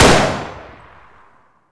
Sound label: revolver shot